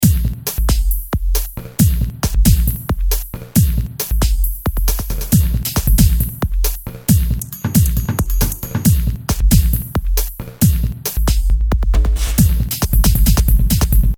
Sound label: machine kit